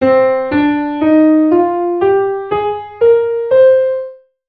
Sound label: Diatonic Natural Minor Scale